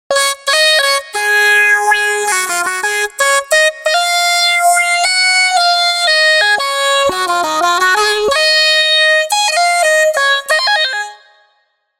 Vocorder

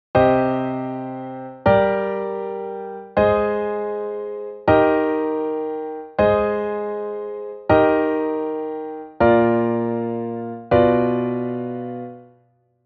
Piano

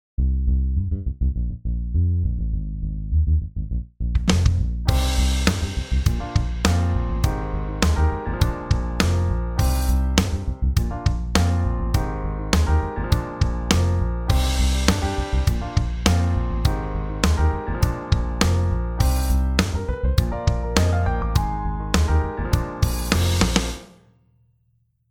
Apple loops basic; guitar

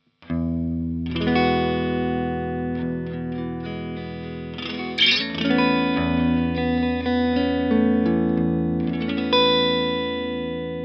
gtr

